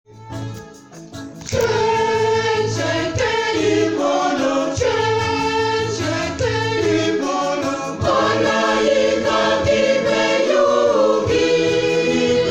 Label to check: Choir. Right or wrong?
Right